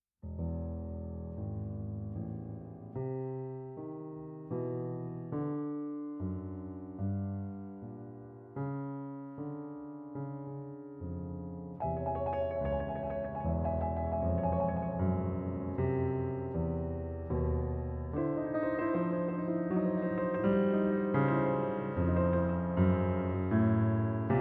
preludes

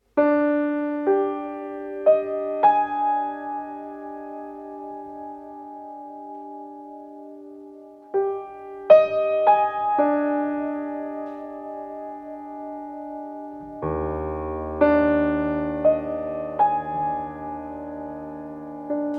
piano

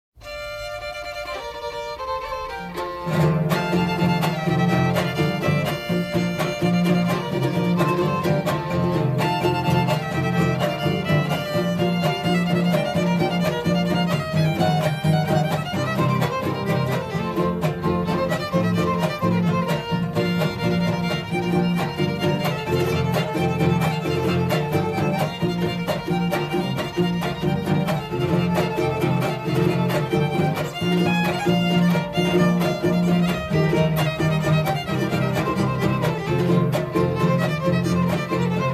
mexico violin

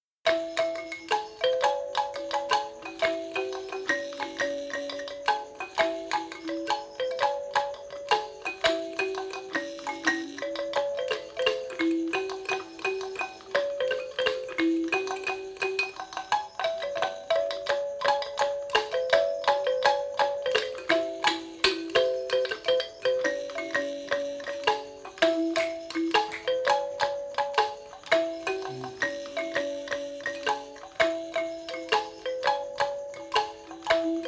urban tribal; Ragnar Johnsonが1971年にエチオピアでフィールド録音した音源集

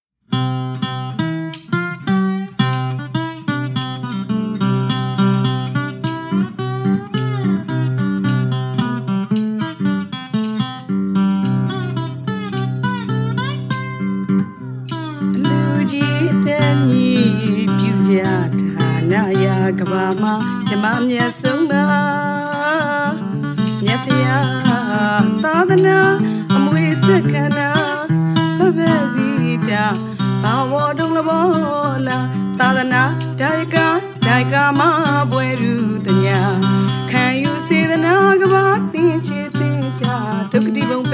music of burma

